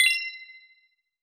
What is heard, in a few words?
Game Notification; Do Not Feed the Monkeys